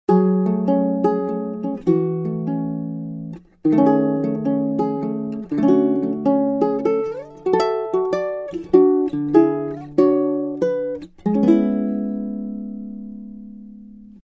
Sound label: BALITON UKULELE